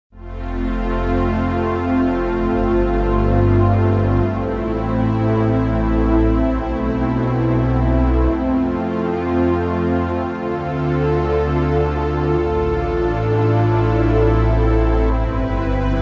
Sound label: JP-8 Pad, Roland JV-880; Factory Patches